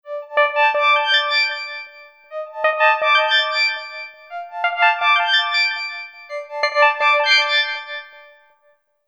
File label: Slinky Synth; UA Masterclass; Trip-Hop